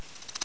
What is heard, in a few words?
Scorpion Walk; Knight Online